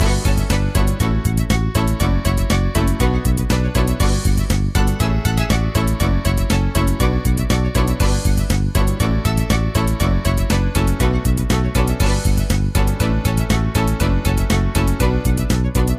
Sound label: Win